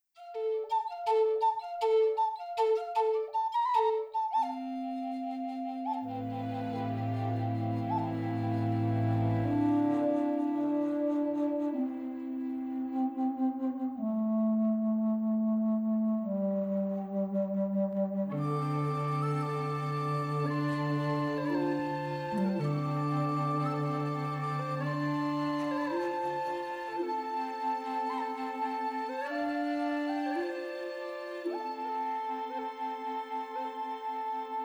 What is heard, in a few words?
Music Only; Electa Quinney